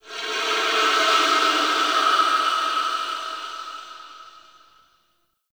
Zariman Whisper Air Draft; Warframe; The New War; Ambience

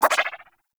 Resonantor Augment Move; Warframe; Bard

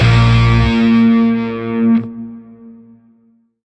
Shawzin Pent Min Chord K; Music; Warframe; Grineer; Chords

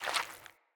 Stuck Sludge Foley Move; Encounters; Animal Rescue

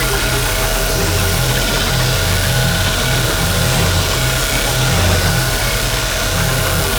Tenet Quanta Beam Loop; Warframe; Corpus

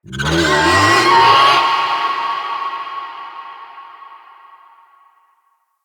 Infested Juggernaut Stomp Charge

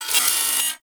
Turret Harness Movement One Off

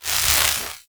Power Rift Arm Movement; Gameplay; Warframe; Zariman

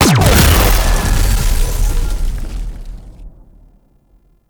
Strike Explosion; Warframe; Trapper

